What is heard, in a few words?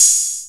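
ANALOG HIHAT OPEN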